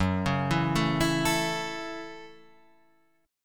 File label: Gb Minor 7th, Gbm7 chord; arpeggiated; JGuitar